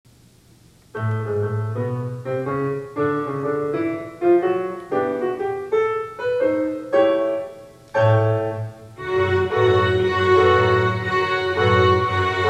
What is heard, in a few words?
A Jazzy Jingle